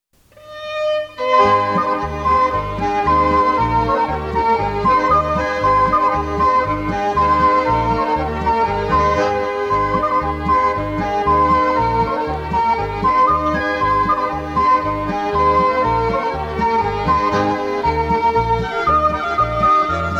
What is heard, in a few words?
Bussarull, Folk Dance Music